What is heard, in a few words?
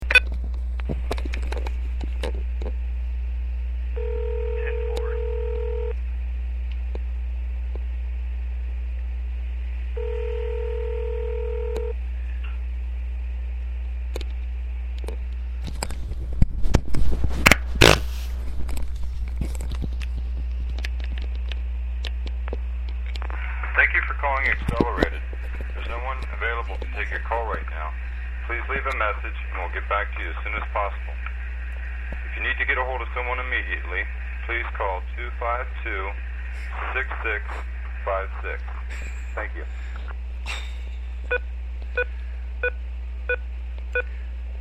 fart